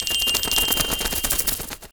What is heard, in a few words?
Enemy Teapot Saucer Attack Motion; Disney Star Smash; Android, iOS, Mobile